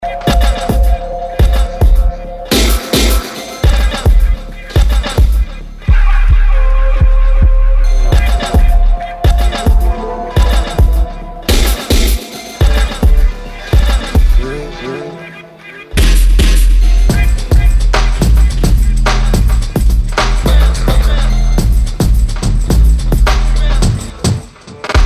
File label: Rainstick Beat